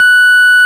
ORG, Farfisa Clarinet

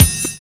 CHIMES; Univers Sons - Kitch 70s

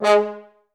FH STAC G#2; Best Service; Peter Siedlaczek's Advanced Orchestra

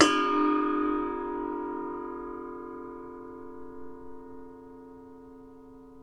NEPAL CYM, Asian Cymbals

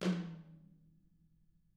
DW 8 HIT; DW KIT1

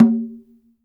GENDANG C6, GENDANG DRUM; Spectrasonics; Heart of Asia

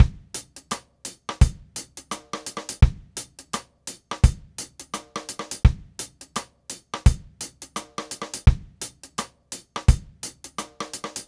Reggae, Drumset; Sonic Foundry (Sony Creative Software); Joe Vitale Latin Percussion

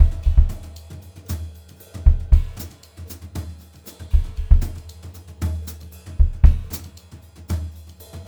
HOTROD VARI, Brushed Drum Loops

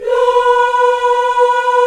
VOX, African Aahs, African Las; Roland LCDP11 Africa VOL-1

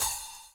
HHO, Dance Mega Drums; Best Service

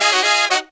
Pop & Funk Brass, 120; Best Service ProSamples vol.25; AKAI

